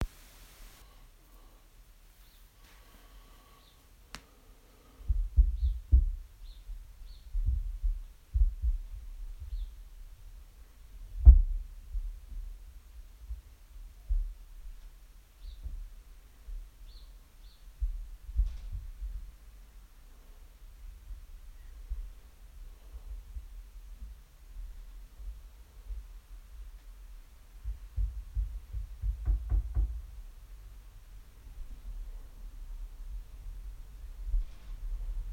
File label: noise